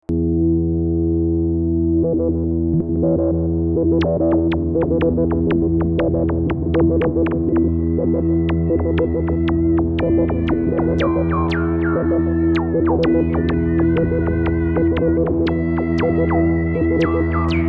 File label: feedback moog